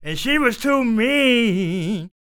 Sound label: SING, Blues Soul; Spectrasonics Vocal Planet